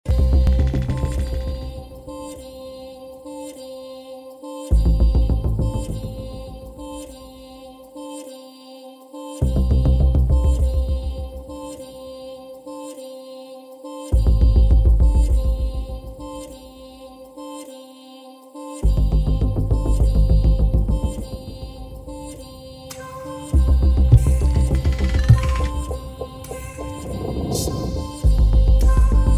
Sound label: loop short